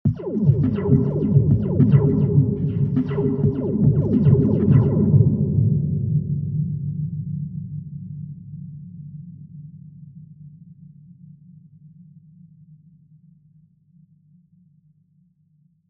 slinky drum

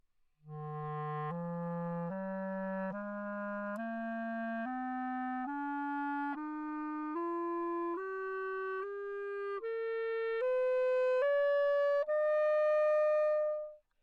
classical clarinet bb, Anechoic; OPENAIR

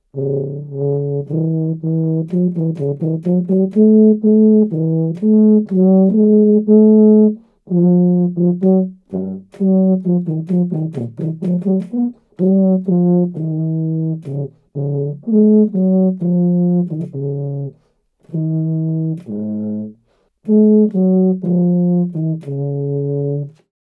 tuba music, Anechoic; OPENAIR; acoustics and psychoacoustics book

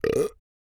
burp; OPENAIR; Anechoic

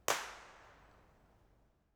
boathouse, creswell crags; b-format

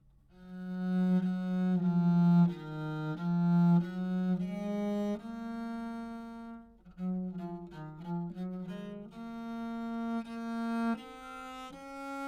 exercise, bass viol back mic, Anechoic; OPENAIR

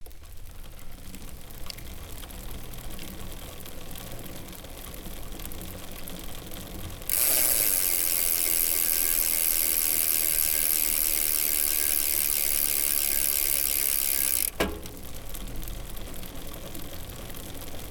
bike, anechoic bicycle; OPENAIR

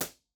kick; genesis 6 studio live room drum set; b-format

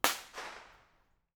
creswell crags; b-format; grundy

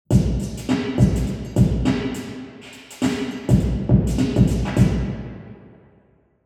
drum; spokane womans club

